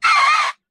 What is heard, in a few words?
Shriek